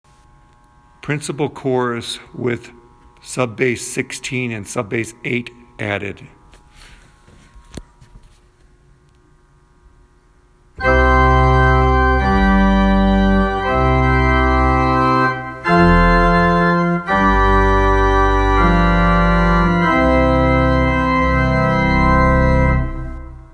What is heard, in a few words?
Pedal, pipe organs; lake ave; Leek Pipe Organs